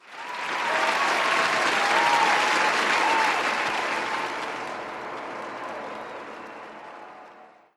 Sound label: crowd